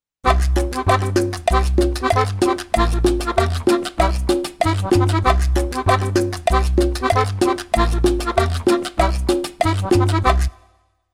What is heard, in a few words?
Cumbia Lp; xp-80; roland; 96:Cumbia Lp patch demo; roland demo cd-3